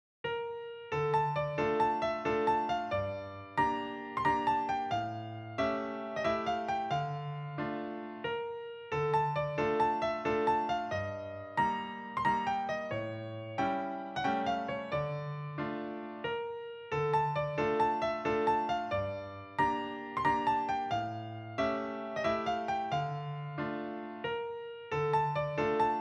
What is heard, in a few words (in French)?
valse; poudras christophe